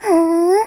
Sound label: match join